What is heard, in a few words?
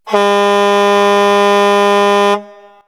Horn; X-Static Goldmine